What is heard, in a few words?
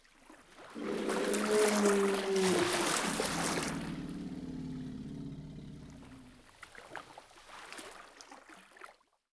OCEAN WARN